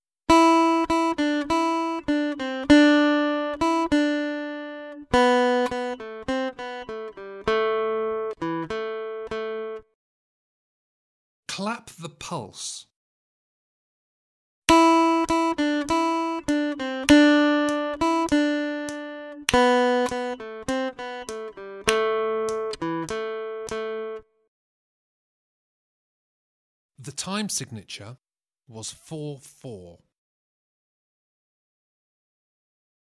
Aural Rhythm Test, acoustic guitar; Grade 2

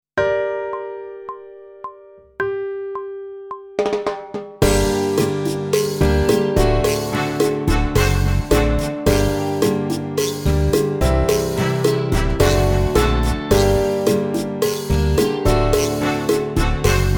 Sight Singing backing track, pop vocals; grade 6

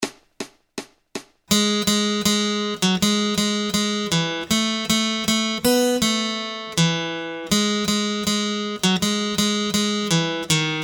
Acoustic Accompaniment, acoustic guitar

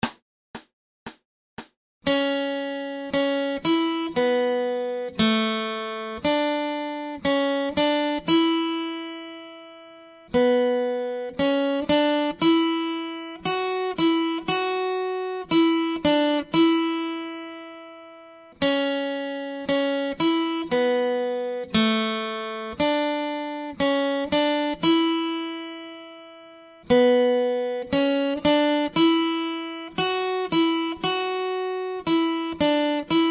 Acoustic Accompaniment, acoustic guitar

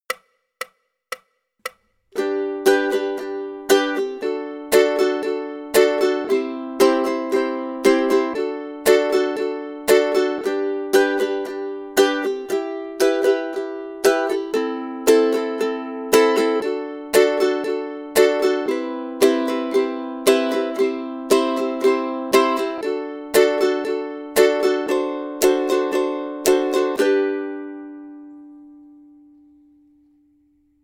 rhythm study, ukulele; grade2

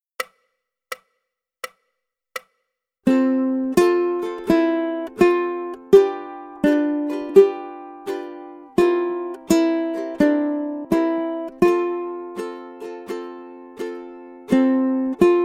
Aura Lee, ukulele

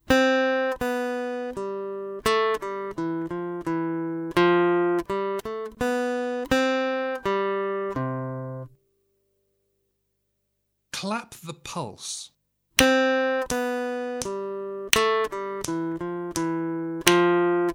Aural Rhythm Test, acoustic guitar

